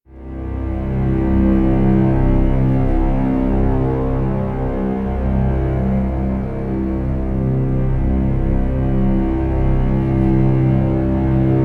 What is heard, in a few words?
Mission Music Low; StarCraft 2 Wings of Liberty